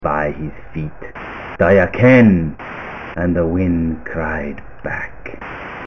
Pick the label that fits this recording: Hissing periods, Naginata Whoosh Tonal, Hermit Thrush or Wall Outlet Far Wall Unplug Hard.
Hissing periods